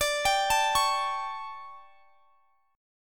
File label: D Major 7th Suspended 4th, chord; DM7sus4 arpeggiated